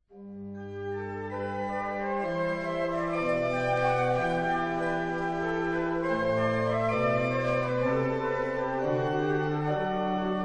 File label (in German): Choralvorspiel, floetenchor